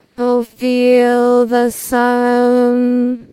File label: singing